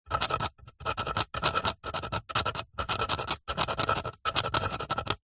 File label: office pen biro write on wood